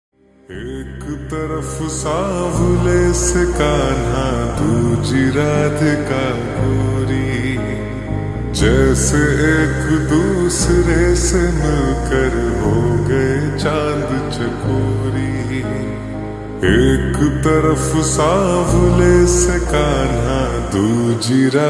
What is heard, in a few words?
Slowed Reverb Ringtone